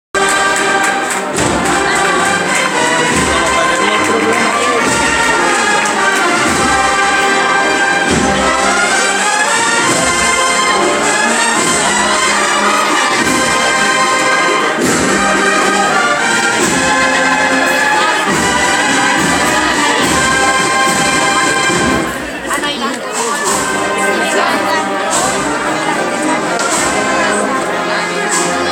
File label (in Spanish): Pasos, Semana Santa Jerez; 2014-04-16 Semana Santa Jerez Miercoles